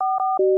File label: pd warn, police; xRadar